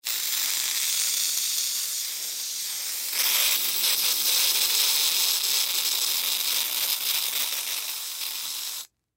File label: Silly String Spray, Silly String Spray Long; Foley; Blastwave